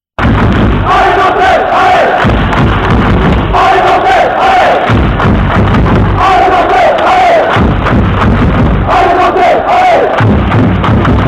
Allez Nantais Allez, Chants; Nantes

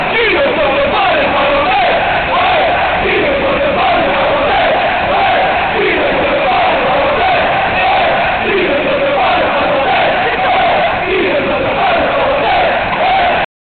Qui Ne Saute Pas, Chants; Nantes

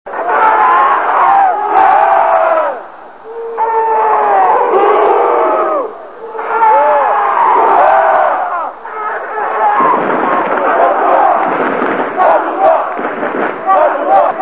stras bour geois, Chants

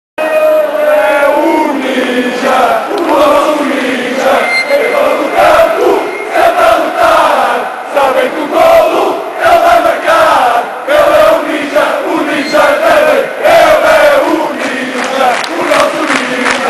Chants; porto; Ninja Derlei

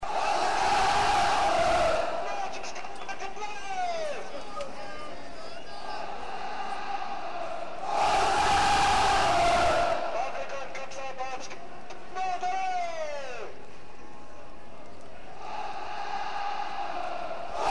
Aux Armes, Chants, Lyon

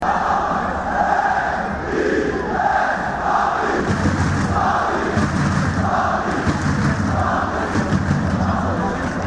P A R I S PARIS, Chants; PSG

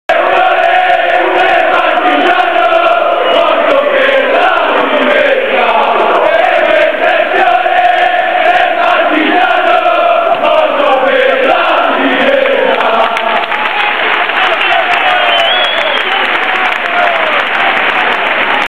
bella ciao, Chants; Livourne

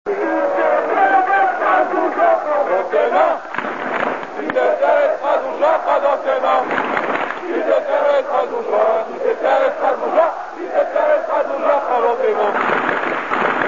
fier, Chants; Strasbourg

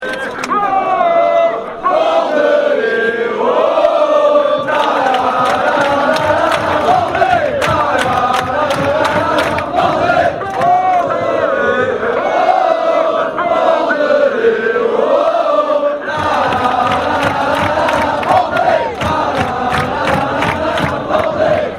Bordelais Oh Oh, Chants

